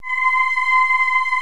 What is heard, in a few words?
CHOIR C6; VOCALS; Greytsounds VOL-1